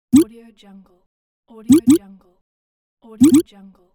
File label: button